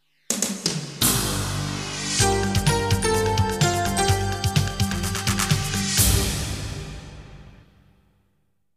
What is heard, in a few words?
jingles; 90 DDD Jingles